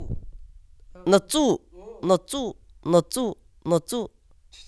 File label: drum